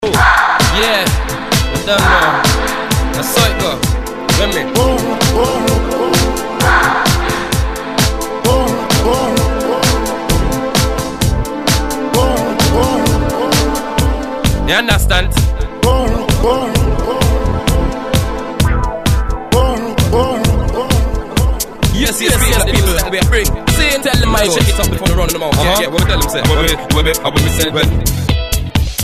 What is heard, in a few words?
No Funk